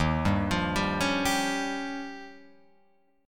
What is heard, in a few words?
Eb 9th, Guitar, Open F; arpeggiated; JGuitar